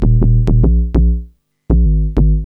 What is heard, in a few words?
bass loop; AMG; Now CD-ROM (Roland)